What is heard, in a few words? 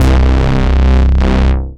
Bass; Basses; Ableton; Factory Packs; Creative Extensions